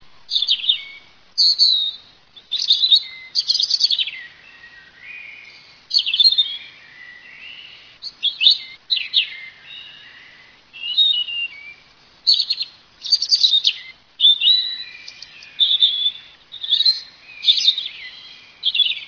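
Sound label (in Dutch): roodborst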